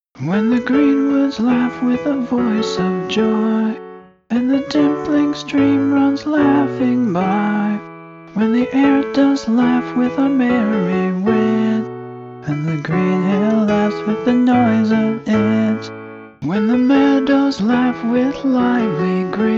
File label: laughing song scratch vocal